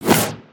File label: Brick Whoosh